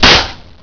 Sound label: pan